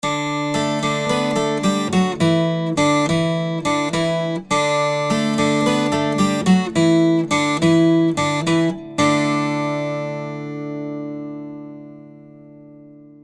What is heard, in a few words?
12 Strg Tamborine